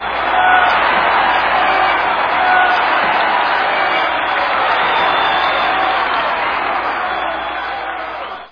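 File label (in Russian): толпа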